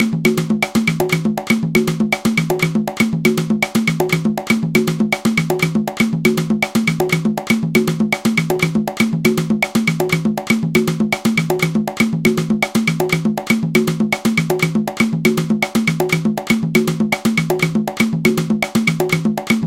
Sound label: columbia matanzas